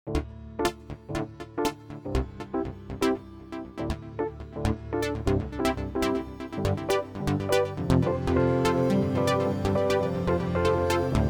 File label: sparkle technoversion